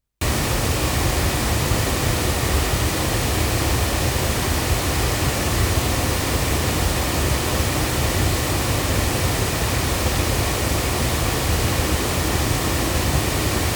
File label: AEA R84 loudspeakers, microphones